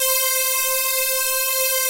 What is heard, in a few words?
PWM PADS; Pro-Rec - Synthsonic; TR PADS